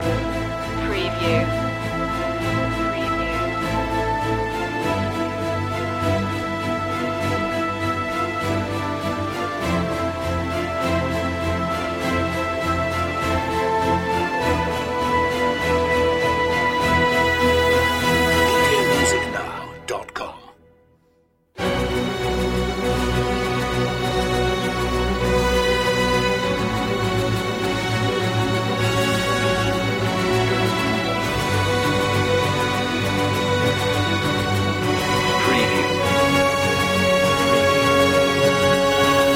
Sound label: no choir no perc